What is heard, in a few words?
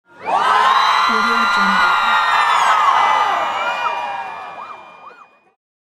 people applauding screaming; کف و جیغ زدن; human sounds